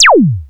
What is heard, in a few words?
ZAPP; ELECTRIC-SET